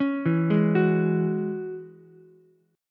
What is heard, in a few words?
E 6th Add 9th E down Ukulele C6; E6add9 arpeggiated; JGuitar